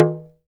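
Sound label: TALKDRM, talking drum; Spectrasonics; Heart of Africa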